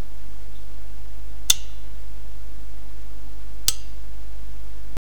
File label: cling cling